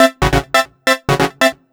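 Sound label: synth 138 A, hooklines, Techno; Techno ID; instrument-loops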